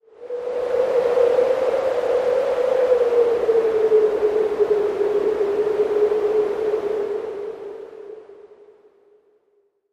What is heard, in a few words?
wind howl, ambiences